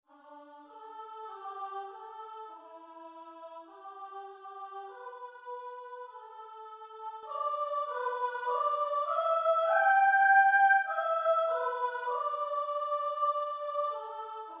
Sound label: pentatonic in g; for singers; pentatonic melody